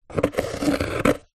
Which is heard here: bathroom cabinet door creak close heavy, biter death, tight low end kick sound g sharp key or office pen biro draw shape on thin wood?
office pen biro draw shape on thin wood